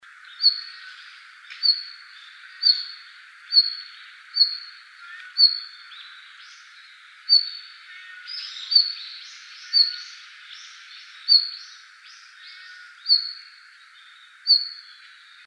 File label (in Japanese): jobitaki